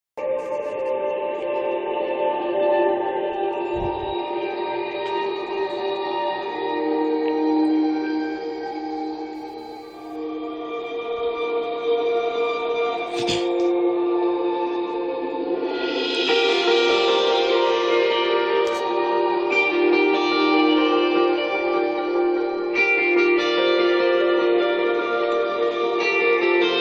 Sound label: vocal sample